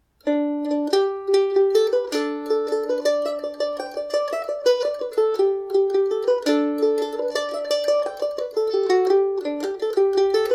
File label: jig, Irish mandolin; kesh